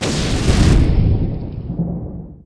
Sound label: b41 fires; RYL